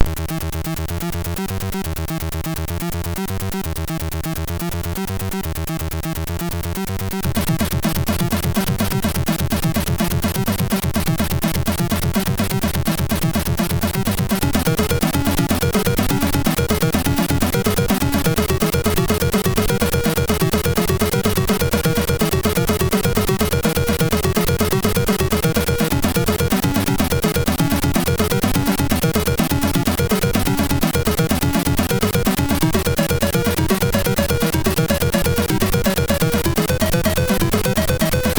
Metal Maniac MOS6581R3; hvsc

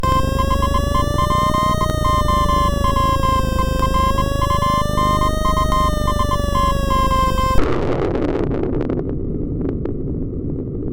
Music Samples, sid, MOS6581R2

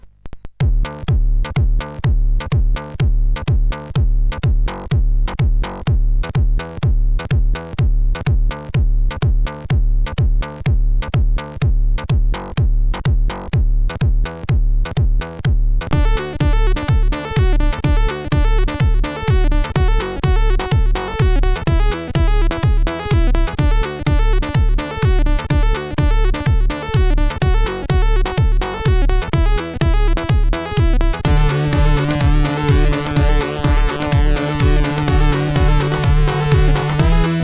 Synthesis, sid CSG8580R5

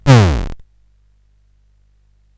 Paddle Mania, CSG8580R5

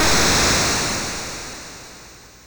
Frogs and Flies, MOS6581R3

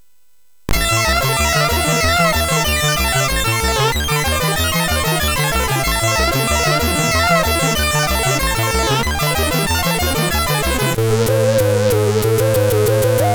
Music Demo Version, MOS6581R3; hvsc

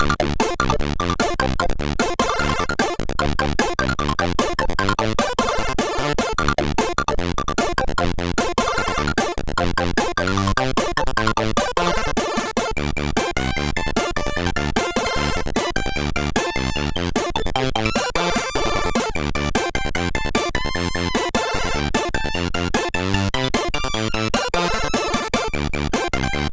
Ninjackie, MOS6581R3

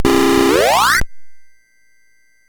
Dragonworld sid MOS6581R4

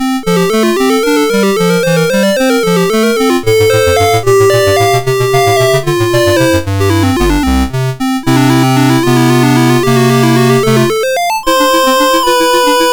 Trio from Sonata, MOS6581R2; Op2 No1

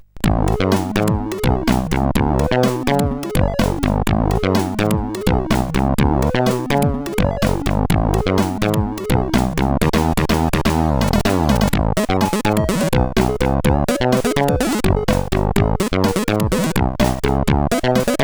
Synthfunk, sid CSG8580R5

